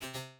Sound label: electric deny